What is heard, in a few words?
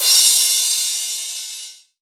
Crash, Drums Cymbal